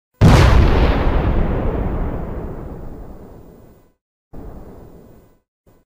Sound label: Spoon IED, Bang; Zomboid; The Engineer